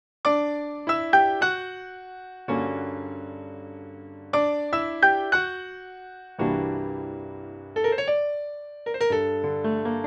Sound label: akoustik piano bechstein; native instruments; the grand